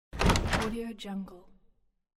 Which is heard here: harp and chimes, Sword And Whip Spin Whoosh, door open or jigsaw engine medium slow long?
door open